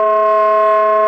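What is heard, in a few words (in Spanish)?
Complejos, saxofón multifónico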